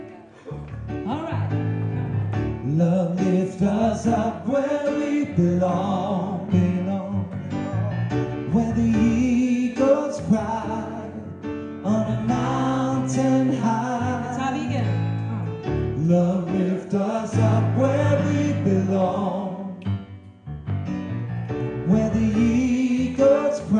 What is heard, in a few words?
tenor; kor